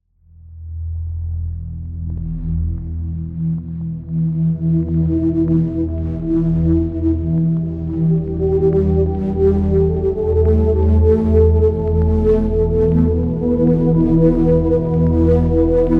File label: Vinyl Ambience Morph